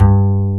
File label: UPRIT BS G2, WOOD BASS